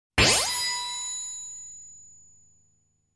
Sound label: Insert Coin; Ninja Gaiden